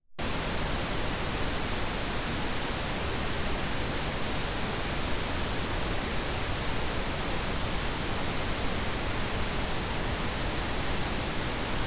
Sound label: pink noise uhj; test signals; ambisonic